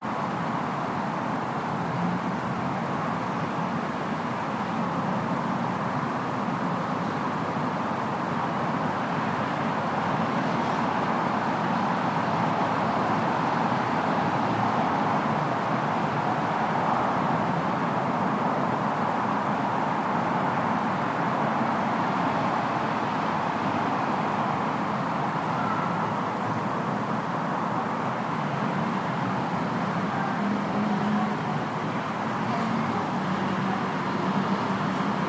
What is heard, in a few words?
RESIDENTIAL Evening Distant Traffic Distant Voices Siren; gain walkers